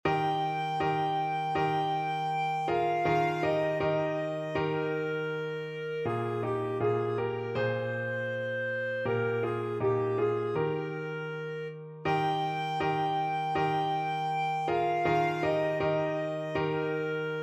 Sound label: lijepa nasa domovino, clarinet